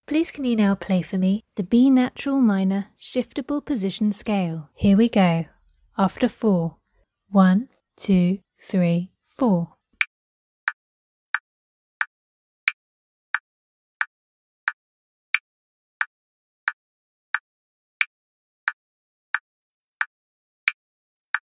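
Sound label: b natural minor shift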